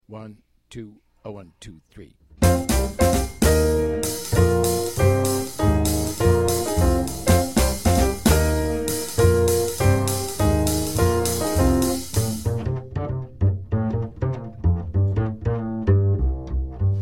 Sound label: minus melody